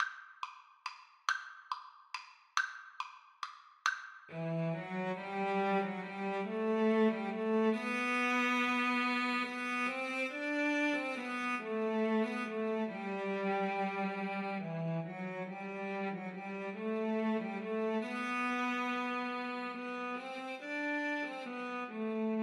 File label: Cello Duet